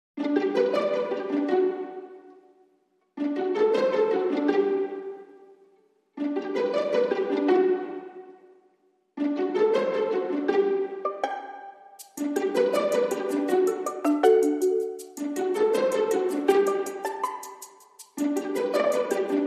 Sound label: No Bass